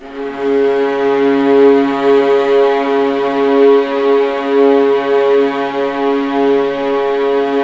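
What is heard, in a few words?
C#1 legato mezzo forte violas, string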